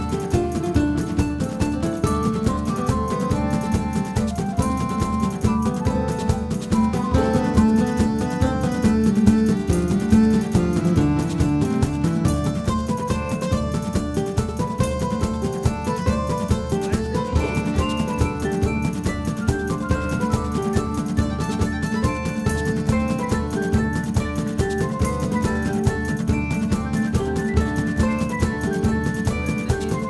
Tavern Theme; Pocket Bravery

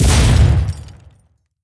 Building Part Smashed

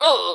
Pecheneg Archer Damage

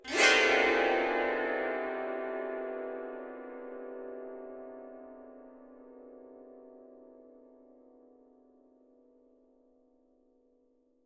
Combat Zither Cluster; curious expedition 2 macos ps4 switch windows xbox one xbox series xs gamerip 2021

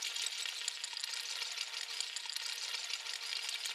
Purple Wires On Loop Trickle

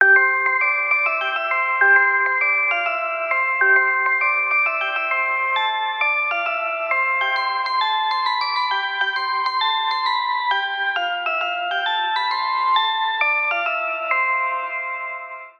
Ice Cream Music; Burnout CRASH!; Xbox 360

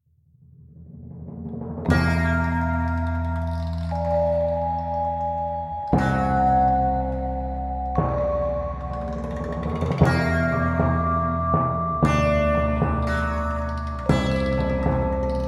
gameplay stinger bad; curious expedition 2 macos ps4 switch windows xbox one xbox series xs gamerip 2021

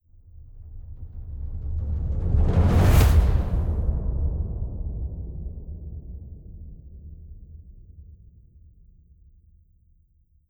Drums Roll

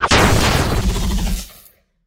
Moonface Attacking; enemies

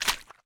Footstep Puddle

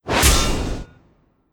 Archmage Attack; Legend of Keepers (gamerip) (2019)

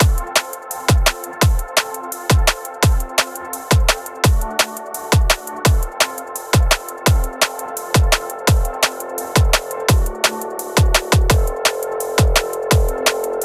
BGM field destroyed

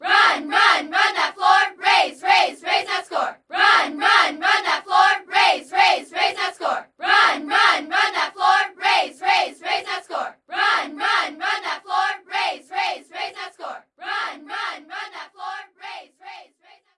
Sfx Basketball

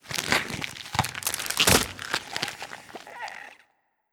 Revive; Skell

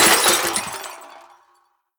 Building Particle Falls On Floor; Rampage

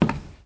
Wood footstep; starbites

